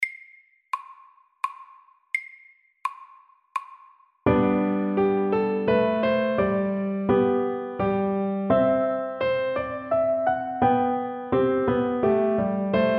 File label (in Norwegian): Menuett SAKTE